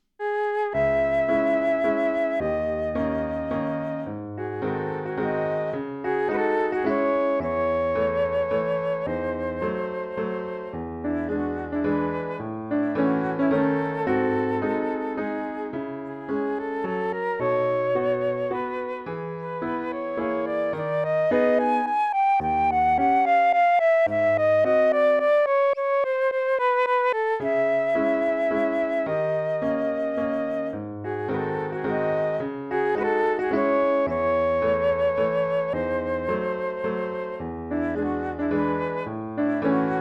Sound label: Waltz In C Sharp Minor, flute; chopin; Op 64 No 2